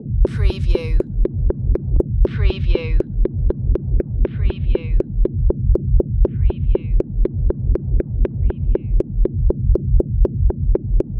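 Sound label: Bass Tension Beat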